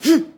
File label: Boxing Female Punch Breath